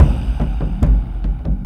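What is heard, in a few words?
Music Shield; From Dust; ps3; xbox 360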